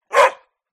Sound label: dog bark single hungarian pointer